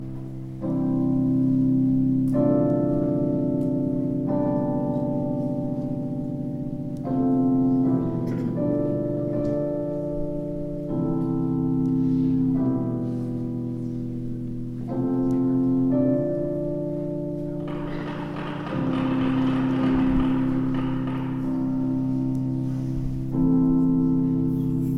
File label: pianist